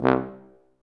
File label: F TUBA, stacc; Roland L-CDX-03 Disk 2; BRS Tuba